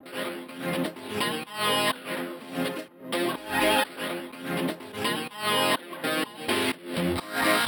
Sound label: F 125 FX, melo filter loop; Tovarish; RoBKTA Sample Pack Supreme